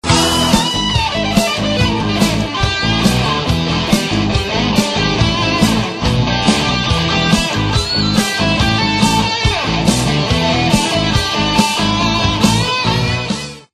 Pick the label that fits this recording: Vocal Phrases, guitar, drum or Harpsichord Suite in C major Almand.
guitar